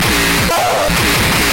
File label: Grind W Ugh